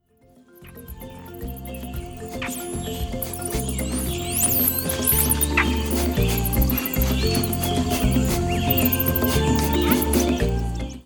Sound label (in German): Im Gewaechshaus mit Originalgeraeuschen